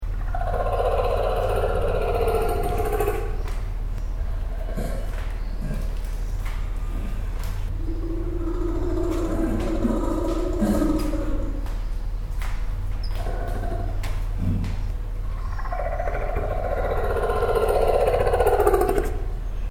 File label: andean bear cooing; mono